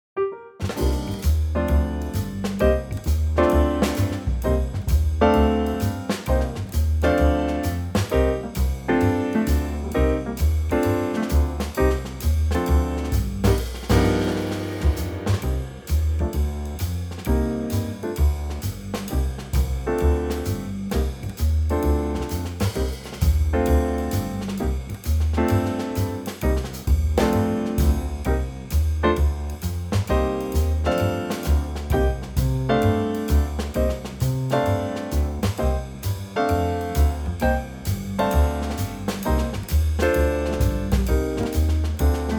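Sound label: Cm Trio, Backing Tracks for Male Vocals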